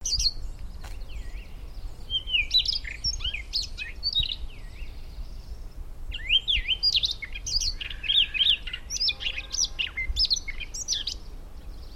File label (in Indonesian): suara kicauan berkecet leher merah